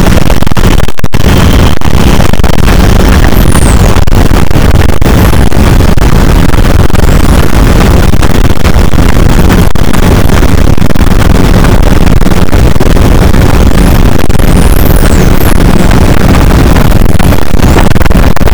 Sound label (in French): cachalot negatif, boat, Physeter Macrocephalus